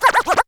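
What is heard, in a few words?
SHORT SCRATCH; BEAT2000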